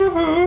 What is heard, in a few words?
huhuh